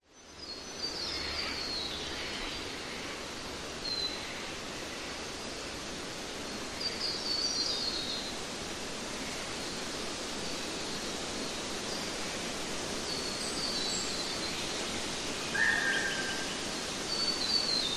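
nature forest birds wind trees leaves falling queensland australia; zapsplat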